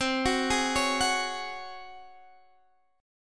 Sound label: C sharp Major 7th Suspended 4th C